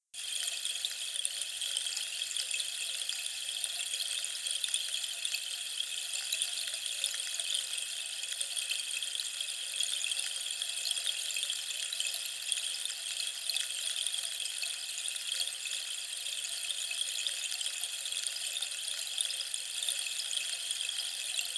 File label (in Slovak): 30 okoume, harmonizujuci dazdovy stlp 30 cm okoume; 4 minuty